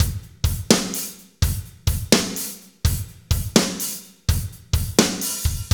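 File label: slow shuffle, Roland drum beats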